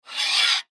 Film Light Raise, Film Light Arri Halogen Raise Stand; Miscellaneous Electronic Sound Effects; Foley; Blastwave